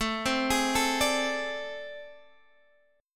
A Minor Major 7th Double Flat 5th A, Guitar, Drop D